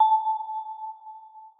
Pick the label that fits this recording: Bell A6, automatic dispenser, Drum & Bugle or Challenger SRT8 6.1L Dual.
Bell A6